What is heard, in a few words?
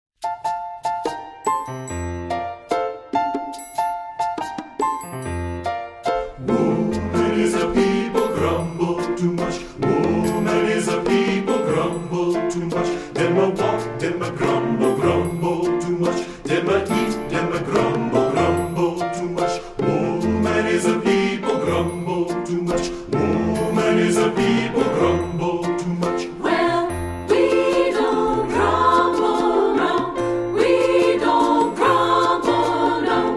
satb